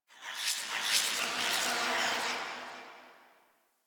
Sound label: Spirit Chatter, Ambience